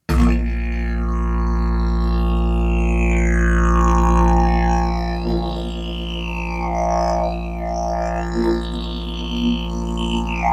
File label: didge